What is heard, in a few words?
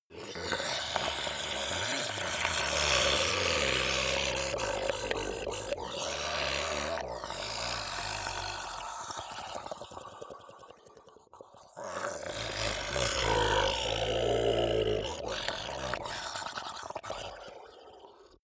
zombie grunt choke male slimy; kreaturen